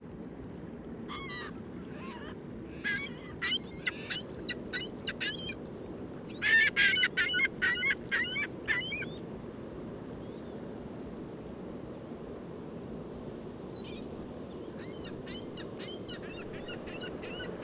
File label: Ring Billed Gull Long Calls Of Adults